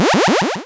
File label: success